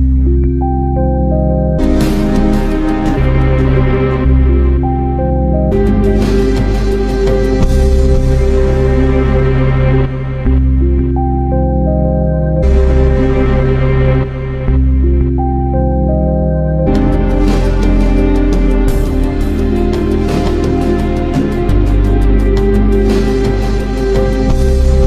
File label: OPPO A7x Original Tone